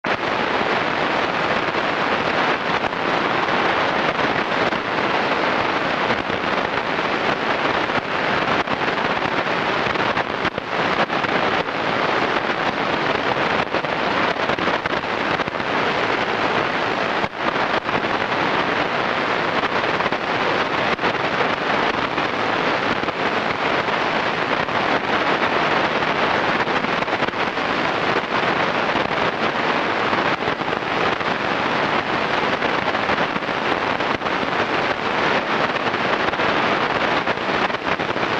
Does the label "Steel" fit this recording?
No